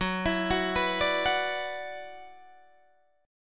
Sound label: F sharp 7th Suspended 4th Sharp 5th F sharp down Guitar DADGAD